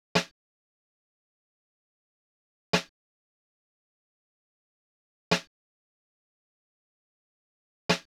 Drum Loop 93 BPM Percussion, Latin; Loop Stems; Cymatics; PARADOX II - Drum Loop Collection; Jammed

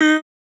Dry One Shot - D#, Vocal; Tonal One Shots; Cymatics; Euphoria Vocal Sample Pack

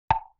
Temple Block Hit; Vintage Cartoon; Drums & Percussion

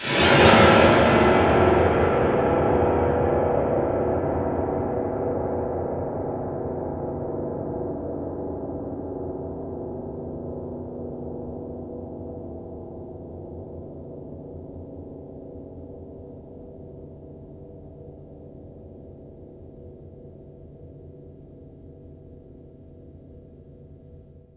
piano fx, Cartoon Film Effects

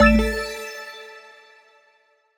Menu FX Organic - A6(Sus2), Video Game; Cymatics; Video Game Sample Pack Vol 1

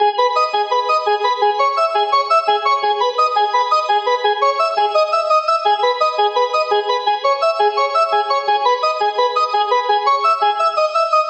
Arp Loop 170 BPM G# Min, Synths; Loops; Cymatics; EDM Starter Pack

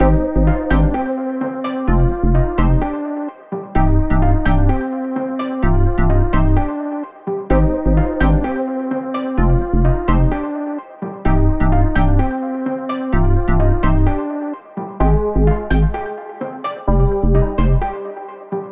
128 BPM C Min (ARP+JU60), synth loop; Yesterday; Cymatics - Fantasy Synth Sample Pack

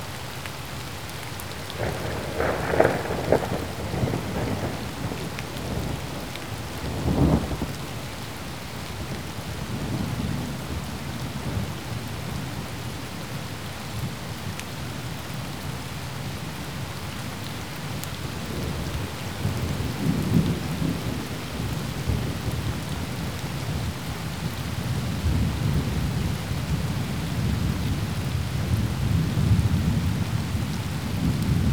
Thunder, rain; FX; Cymatics; Eternity Sample Pack

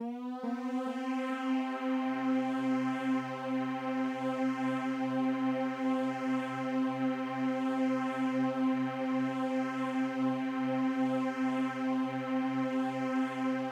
PAD Detune (C); Melodic One Shots; Cymatics; GHOST (Beta Pack)

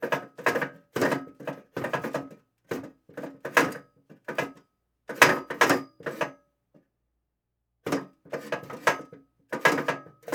Struggling Metal Chair; Fairlight Sound Lib

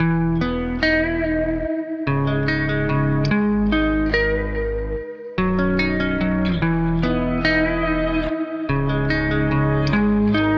145 BPM G# Min, Guitar; Stuck; Cymatics

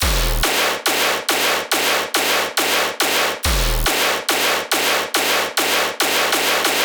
Bass Loop D# 140BPM, Dubstep; Fairy Bass; Ghosthack - AC2024 - Day 13 - Dubstep Sounds 2025

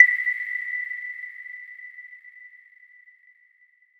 Impact

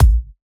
Bassdrum, Dubstep

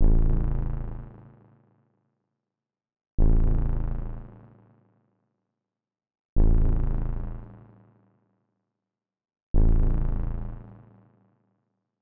151 BPM G# Min Bass; Trap Melody; Astro

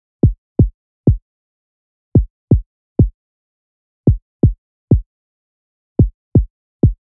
Slap House Kit 125bpm Percussion; EDM Drums; Drum Kits; Stems; Ghosthack; Ultimate EDM Drums; Slap House Kit 14

